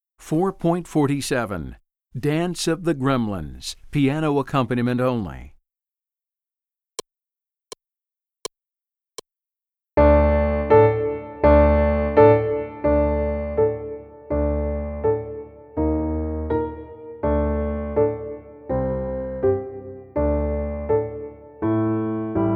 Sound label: Piano Accompaniment